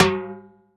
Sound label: small reverb tom drum sample f sharp key